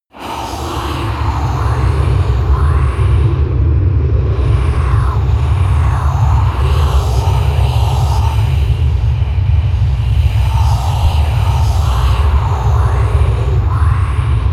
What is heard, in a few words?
atmosphere voices from hell, Horror